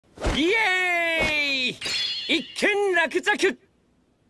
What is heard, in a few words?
yay; gojo